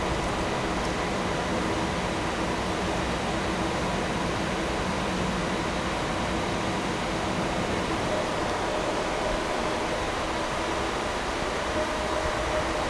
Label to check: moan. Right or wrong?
Wrong